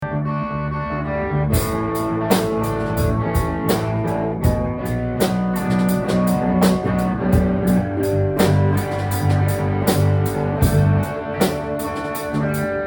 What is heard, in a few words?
Mellow Jam